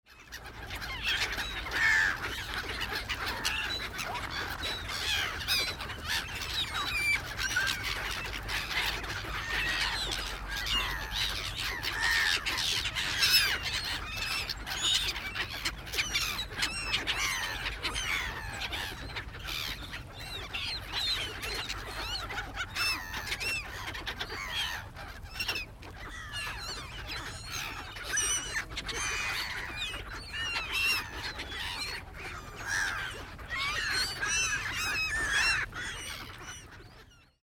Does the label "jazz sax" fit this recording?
No